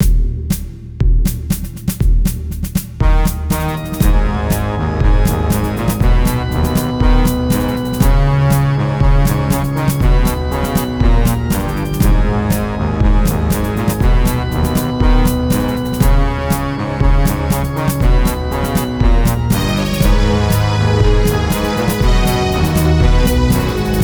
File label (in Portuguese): Música apenas acompanhamento